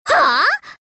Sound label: hah